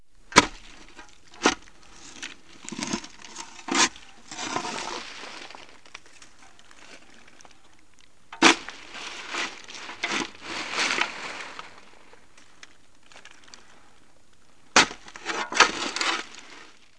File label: shovel; KABOOM! - Wild and Wacky Sound Software; outdoors elements